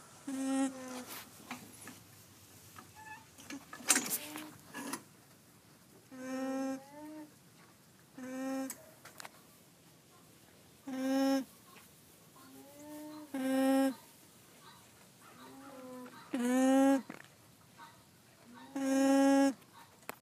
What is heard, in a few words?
Hum, alpacas